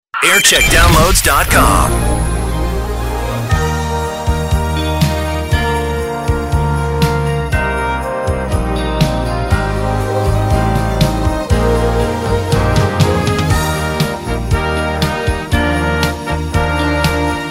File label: xmas jingle montage